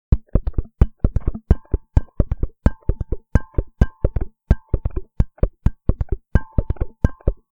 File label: Electric guitar muted strings simple rythm